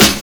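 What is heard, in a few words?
savage snare; Drums In Your FACE!; 90 s Sample CDs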